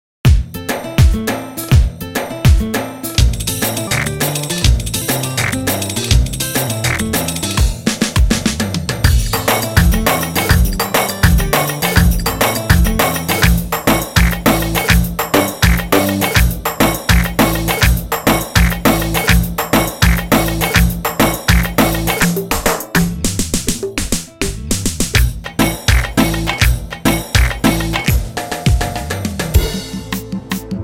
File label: Clasic